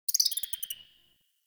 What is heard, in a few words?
Tree Critters; Warframe; Duviri; Ambience